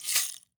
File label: Rainstick Large Shaker; World Percussion; Cymatics; Emperor World Percussion